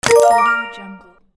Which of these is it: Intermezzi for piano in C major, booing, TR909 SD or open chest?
open chest